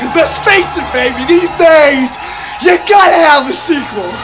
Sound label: scream